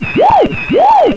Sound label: Radio Wah Wah; Let's Discover New York